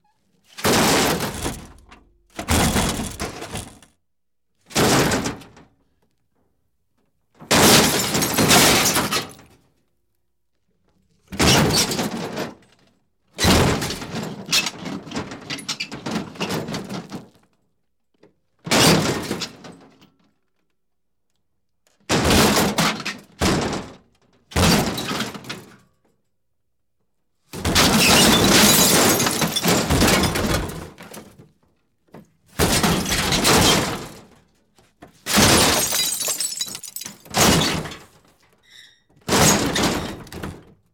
Metal Crashes Various Falls

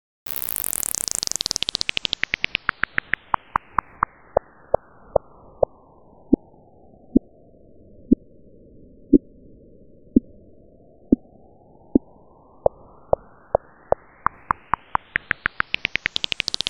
Weird Riffle

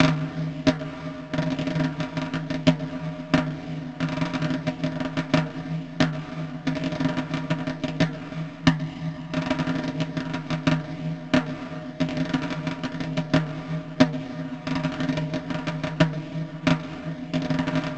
Flanged War Drums

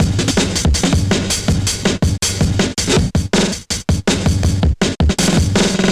Tech Random Cuts

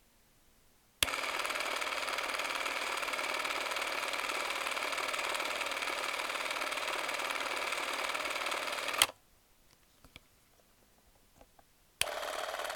Super 8 Camera Sound Chinon 723 Xl

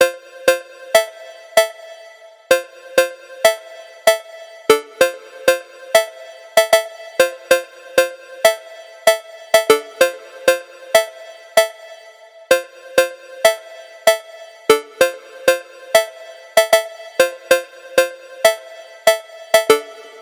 Phonk Bell

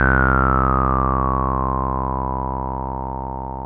Bass

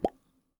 Message Sent

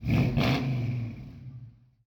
Muscle Car Revving

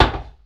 Muted Thud

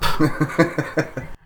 Voices Male Laughter Mild Chuckles

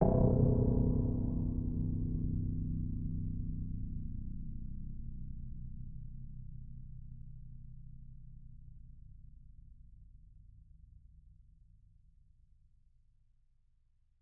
Eerie Tense Hit

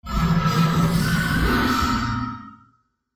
Scary Sound In A Room

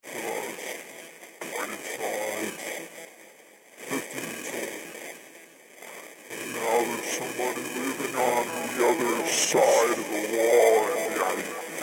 Horror Sound Design

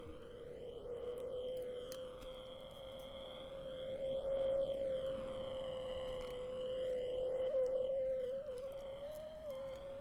Sinister doppler

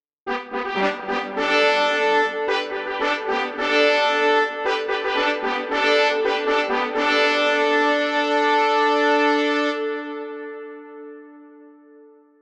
Horns

